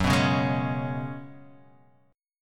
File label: Gb Suspended 2nd Suspended 4th, Guitar, Half Step Down